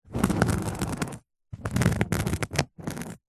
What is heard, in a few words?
small sharp spike scratch carpet; foley